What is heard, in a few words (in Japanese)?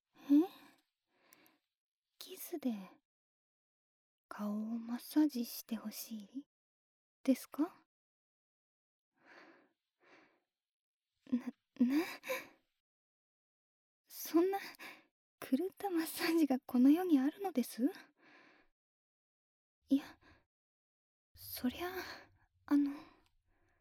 キスでフェイスマッサージ, ASMR